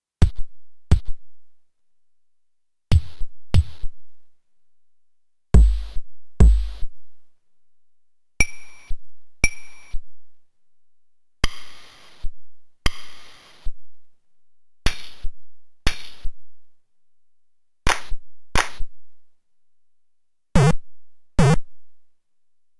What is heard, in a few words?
sounds